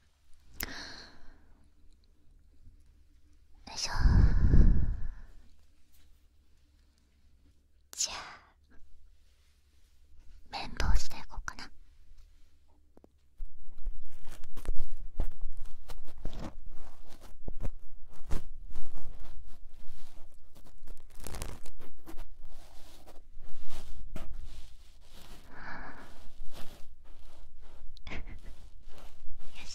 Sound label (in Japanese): 耳かき; 耳かきとかされていただきます